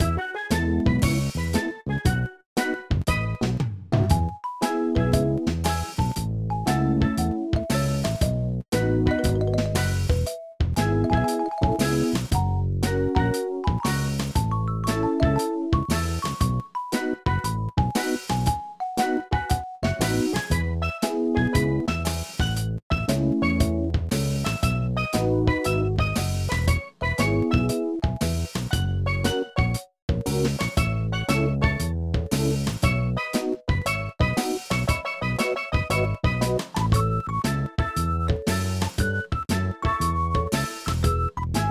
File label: Music Aqua; Sesame Street Elmo's A to Zoo Adventure; nds